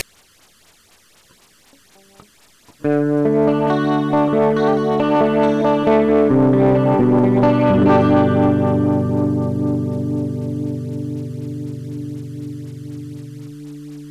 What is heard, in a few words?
recording chorus